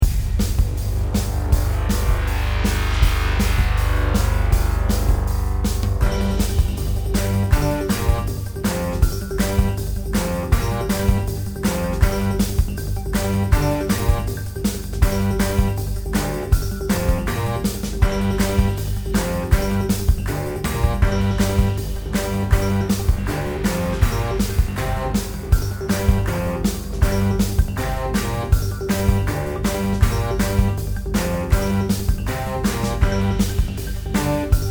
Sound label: Stick Clicks